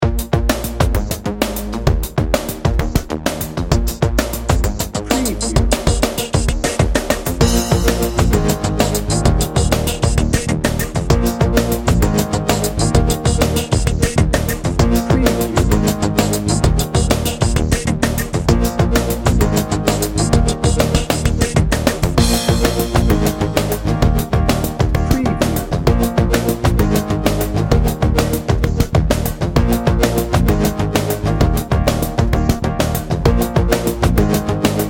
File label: soul electro no horns